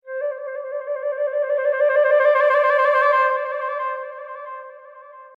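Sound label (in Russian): звуковой эффект флейта flute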